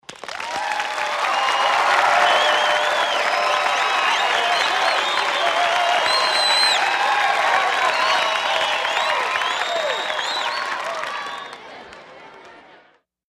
Game Show Applause, Game show applause, cheers, whistles